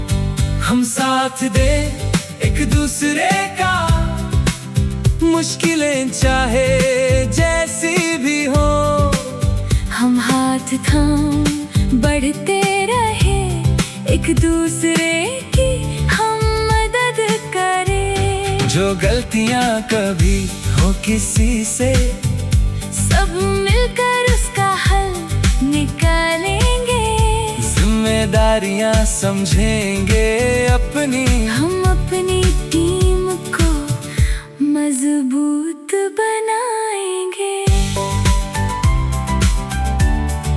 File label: Team Building Song; corporate